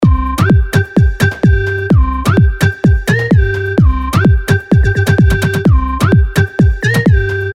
LD Skittle Flute, sylenth1 dirty dutch leads; revealed sylenth1 dirty dutch leads vol 1

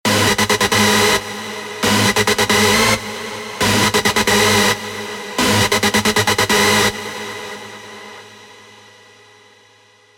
Stab; Stab Nachtrausch; Revealed Serum 2 Big Room Techno Drops Vol. 1

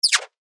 Ladybug, percussion; revealed percussion vol 3

